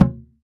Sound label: Wadon, Roland BK-5 Percussion